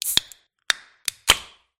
soda beer can opening

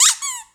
squeaky rubber ducky

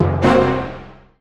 orchestra hit; drawnTogether

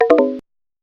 msn msg

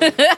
heheh

